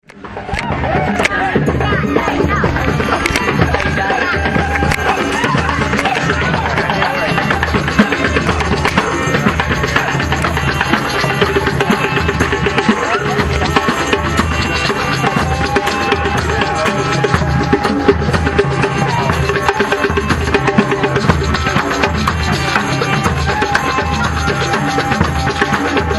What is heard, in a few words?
Congas; Rainbow NM 2009